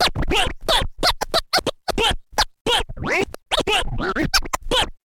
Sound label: Scratch Ringtone